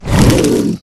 dragon death

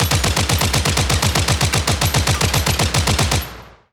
heroes bullet Rain cast; broden

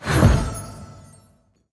heroes call Of Triumph cast; lumenir

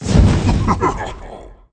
dark pact; veznan

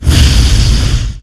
dragon flamethrower

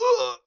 Human Dead

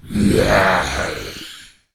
canibal zombie

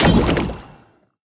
heroes squish N Squash impact

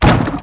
dwarf death barrel

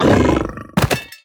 minotaur death

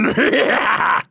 laugh only; TenShi buffed

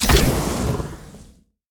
tower block spit; sfx; murglun

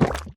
mud summon; wukong princess

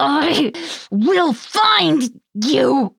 voice death; anya

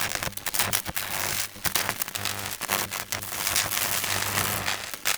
spiders tower heode spike sparks loop

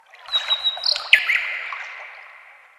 ambience single bird and stream

